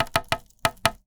Polystyrene Creak

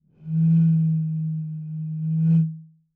Bottle Blown

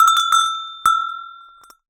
Cowbell Chain, Percussion

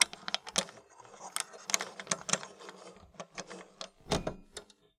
Typewriter

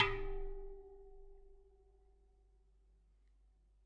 Bicycle Spoke

